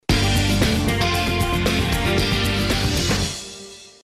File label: TV2 signal szignal